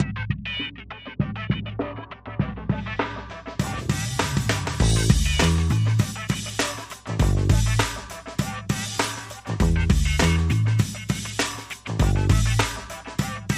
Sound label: Funk